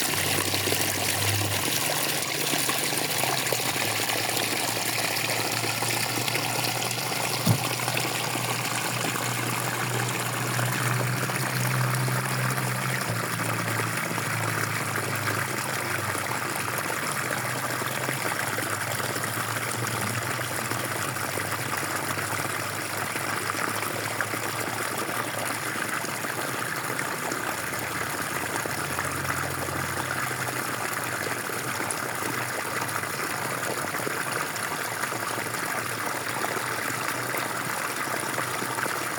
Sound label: Water sound effect